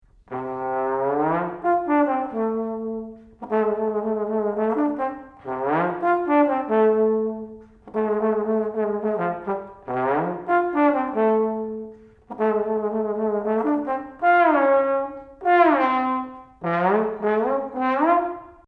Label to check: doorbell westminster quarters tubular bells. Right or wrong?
Wrong